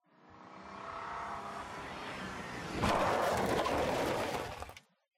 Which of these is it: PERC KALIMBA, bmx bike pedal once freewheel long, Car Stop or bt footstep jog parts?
Car Stop